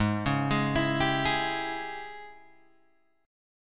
G sharp Major 7th Suspended 4th Sharp 5th, Guitar, Open G